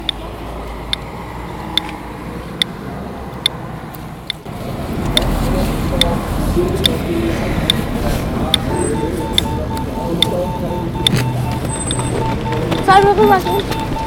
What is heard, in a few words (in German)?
Blindenampel